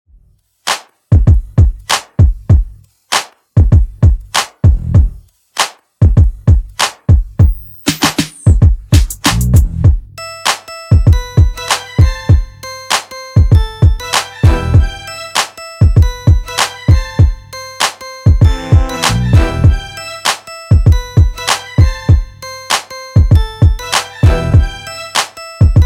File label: Extended 98Bpm